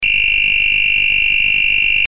sonido electronico; 24v cc

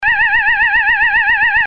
sonido electronico; 24v cc

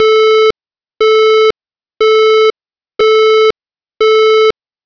Tono Intermitente 440Hz, sirena electrónica; (Tono 2 externo); sirena electronica 30 sonidos seleccionables; 12-24v cca

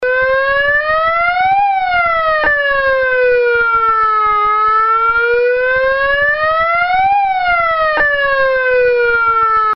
Tono Lento y creciente de 440 a 880Hz, sirena electrónica; (Tono 3 externo); sirena electronica 30 sonidos seleccionables

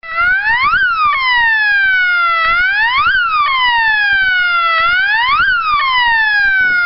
Tono YELP lento crec. y decrec. 650Hz - 1600Hz, sirena electrónica; sirena electronica 30 sonidos seleccionables

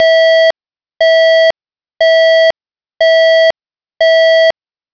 Tono Intermitente 660Hz, sirena electrónica; sirena electronica 30 sonidos seleccionables

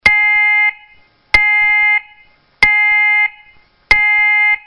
sonido electronico; 24v cc

